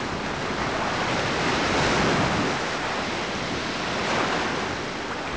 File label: water land; Pirates Of The Caribbean